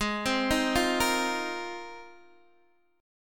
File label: G sharp 6th Add 9th, Guitar, Open D